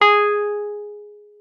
WURLITZER, G#4; Xsample VOL-1; 90 s Sample CDs; WURLITZER RS

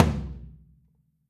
Drums Percussion

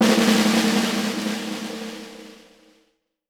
SN, Percussion

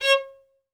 Synclavier Strings, SOLO VI; ILIO

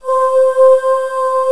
C5 FEMALE

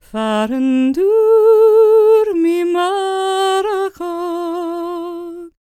GAELIC, World Voices, GAELIC FEM

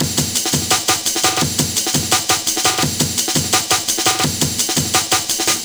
Kickin Break, Drum & Bass, Beats & Breaks

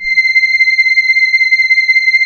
Poly800 C5, Korg; Back In Time Records; Korg Universe VOL-1

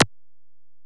EPerc, Alesis DM-PRO

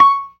PERC, ORGAN; Keith Emerson Signature Series

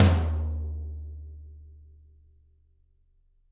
Toms, Drums; Bob Clearmountain Drums I

